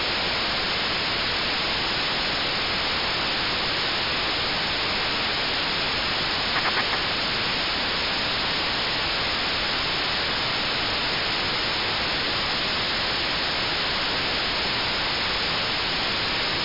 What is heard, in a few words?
MSK144 40ms train, 432mhz